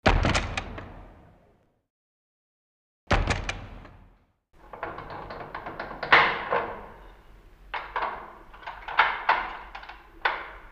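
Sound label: prison, opening many locks and bolts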